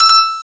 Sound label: Rec End, Samsung SGH-i600